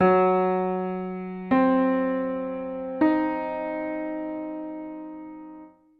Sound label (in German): verm arp, gehoerbildung